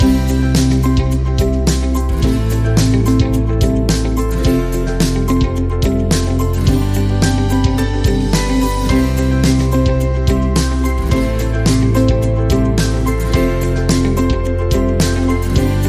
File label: Acoustic Inspiration